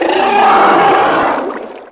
slime die; ghouls forest2; slimejumper

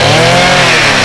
chainsaw full, weapons

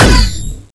laser fire, weapons

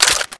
hk416 mag out, weapons; cso

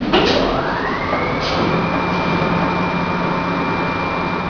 small lift move

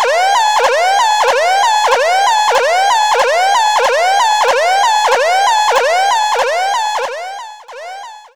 big warning; diekrypta; ambience

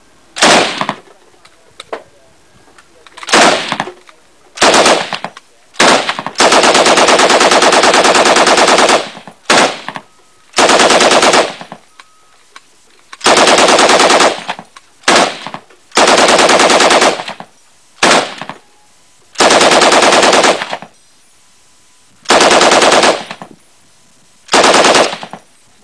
Battle

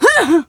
die, female

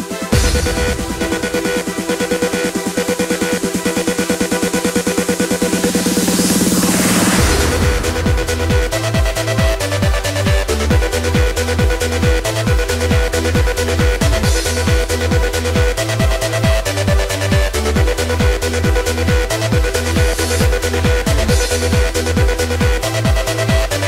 sandstorm

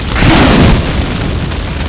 stab, weapons

